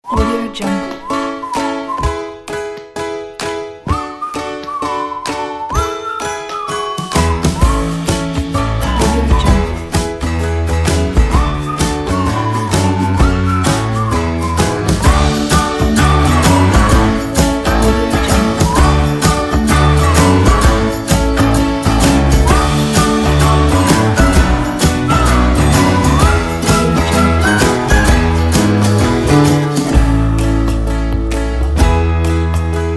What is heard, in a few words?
happy uplifting fun